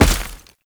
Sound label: Heavy Step Dirt, Footsteps, Foley; Enemies; Warframe; Tau Dax